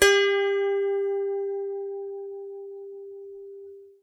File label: lap harp pick G4; Ethnic